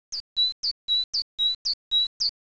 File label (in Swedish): talgoxe